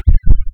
drown, player

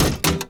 reload, incendiary cannon; weapons

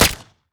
tranq fire; weapons

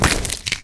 fall pain, footsteps; movement

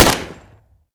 supernailgun fire; weapons

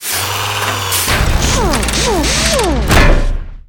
build, sentry

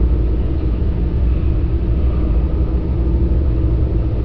ambience; Fortress Forever

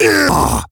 death, player

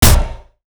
pellet fire, shotgun; weapons

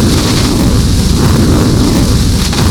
rpg fly; weapons; bullets

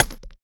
Pipe bounce, pipelauncher; weapons

